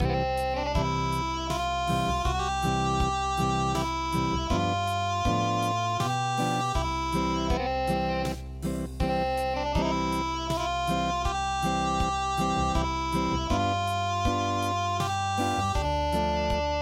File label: Acoustic